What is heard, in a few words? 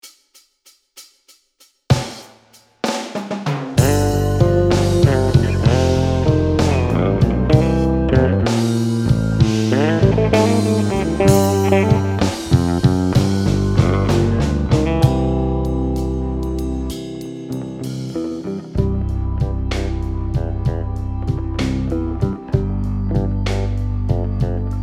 Blues, bass